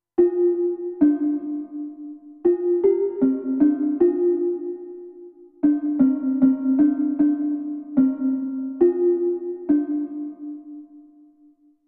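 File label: Glass Perc, digital piano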